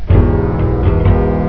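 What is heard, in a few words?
MEXICAN, instrument; INSTRMNT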